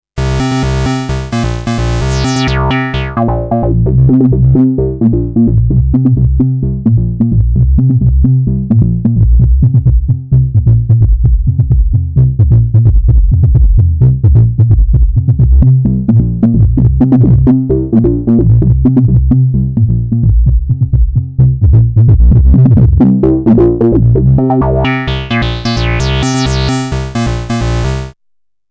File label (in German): Tiefbass Square; MBS 100